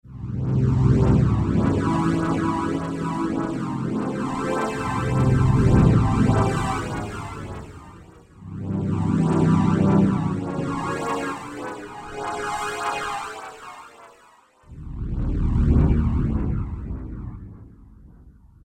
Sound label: 3D UFO, Roland SC-880; Factory Patches